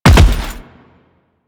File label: Land Heavy Default, Footsteps; Enemies; Warframe; Teshin